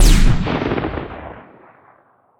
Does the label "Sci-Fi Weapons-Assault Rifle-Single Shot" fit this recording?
Yes